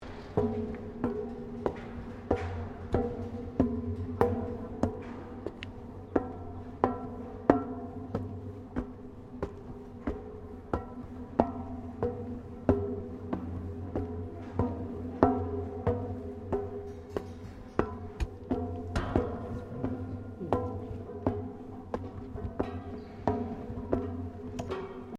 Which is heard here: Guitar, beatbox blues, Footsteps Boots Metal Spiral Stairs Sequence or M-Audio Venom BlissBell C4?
Footsteps Boots Metal Spiral Stairs Sequence